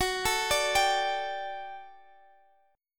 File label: G Suspended 2nd F sharp, Guitar; Gsus2/F#; arpeggiated